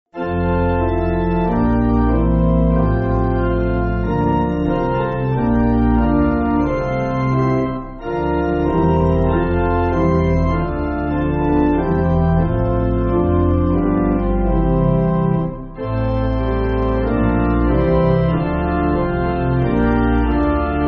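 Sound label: Pipe, Pipe Organ, Backing Files for Churches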